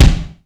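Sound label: tile room bass drum; East West; Global Sounds; HR16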